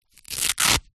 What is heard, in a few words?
sport goalkeeper glove velcro strap pull